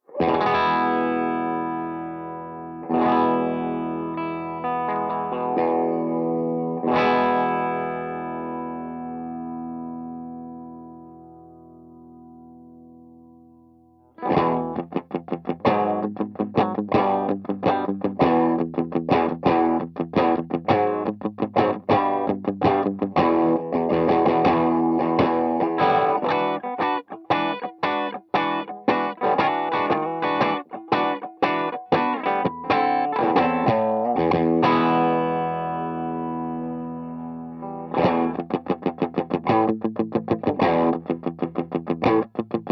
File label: BASSMAN HB